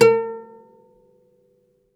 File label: OUD A3 -L; E-MU Producer Series Vol. 7 – Old World Instruments